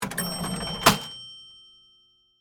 Cash Register Open, Industry, Cash, Register, Open, Drawer, Bell; Cash Registers; BlastWave